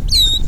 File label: Glossy starling mimic alarm call made by drongo in a food theft attempt